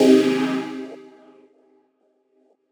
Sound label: Cm, Chords; CherryPie; M8; Electronisounds